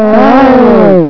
EVIL HIT

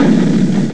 BOOM; shoot-ups; incinerator

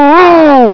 GUY HIT

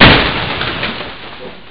Car Crash

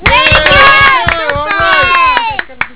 CLAP; EDUCATE; JURASSIC

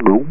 quaff

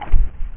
SPIKE

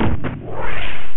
missile

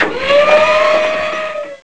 SERVO

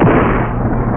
TANK; SHOOT'EM UP; INTREPID

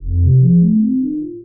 Sweep Up short

